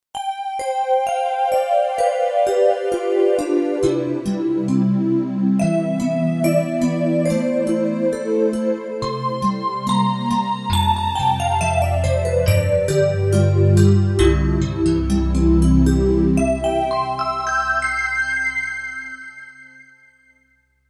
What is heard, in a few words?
Tria Bells, Roland JV-880; Factory Patches